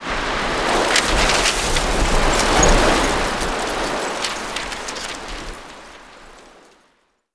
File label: blowing papers